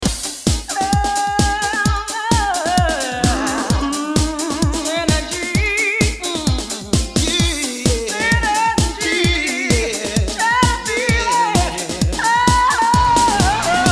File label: Jazz Version